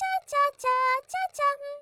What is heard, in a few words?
windows xp startup; miku